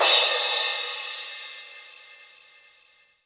roomy crash cymbal audio clip D key, Royality free crash cymbal tuned to the D note. Loudest frequency: 5562Hz